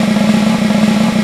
SNAROLL, SNARES; Back In Time Records; Big Bang

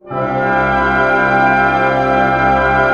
PENTA C PP, orch penta; Sound On Sound - Peter Siedleczek's Orchestra

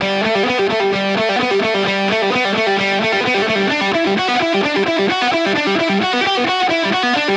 E Gtr Fast Tap Duel Top; Zero-G; Future Wave

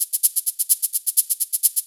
Hihat Loop 128 BPM

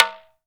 TALK DRM, Heart of Africa; Spectrasonics

